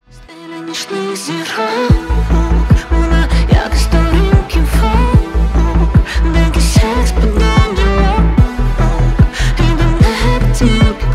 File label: indie rock